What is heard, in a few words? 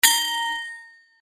Sword Sound; FXHome; Fighting Sounds